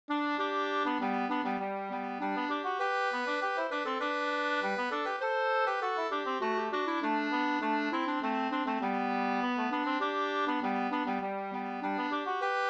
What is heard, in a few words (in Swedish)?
ganglat